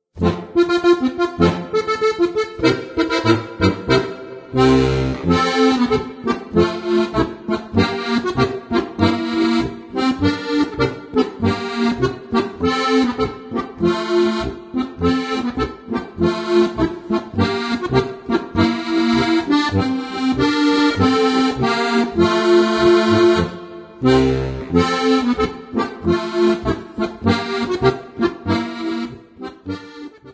Alpbach Marsch

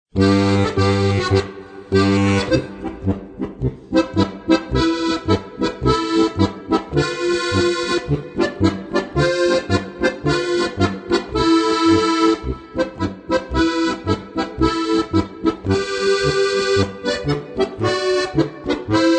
Amsel-Polka

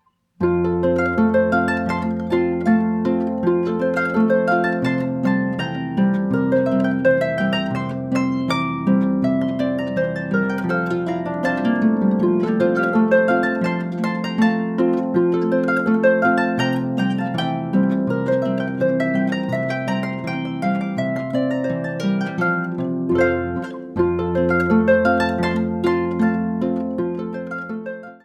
polka in f

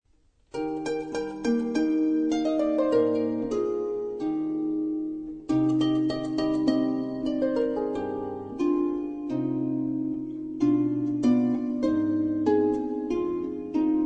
Saitenspiele

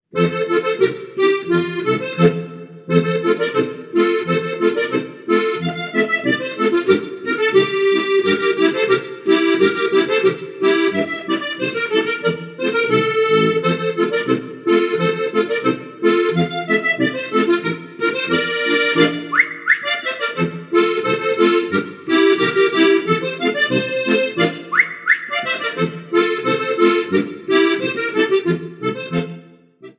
Polka Playalong

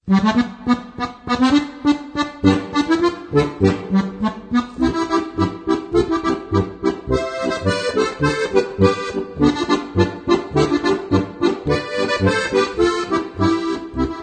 Amtsgerichts Polka

